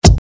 kick

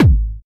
Soft, kicks

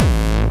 Bombo Hardcore, kicks

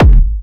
kicks

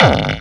Hard Kick

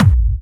Medium, kicks

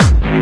Kick n Bass

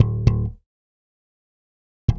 The Deep Slap

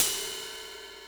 CYMBAL, Yamaha RM 50

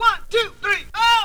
Vinyl

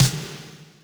snaredrum, Yamaha RM 50; Drum Samples; Snaredrums

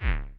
kicks, Bass Drum

